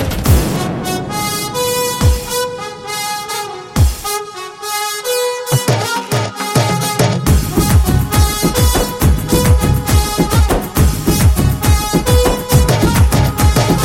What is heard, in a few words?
Instrumental Ringtone